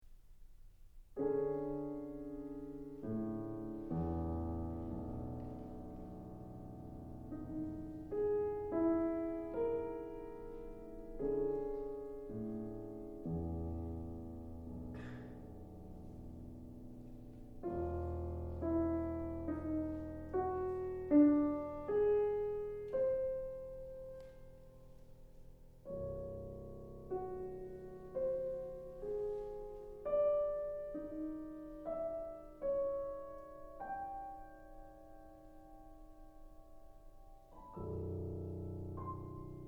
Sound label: piano; six short pieces; V. Fragment